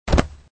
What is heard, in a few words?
land; tempala